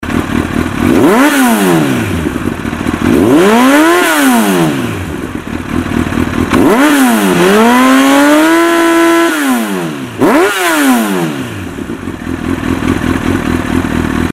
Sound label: CBR1000RR titanium slip on, exhaust sound; honda; Arata